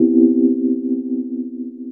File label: house synth 125 A, keys